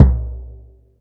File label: BD, Bassdrums, Roland TD-20